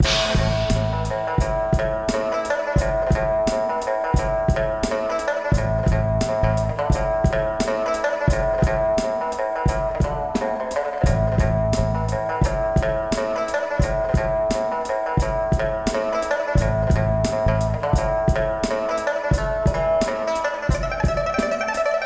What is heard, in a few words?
Fight Beats; Fisk City; Speep n Dogs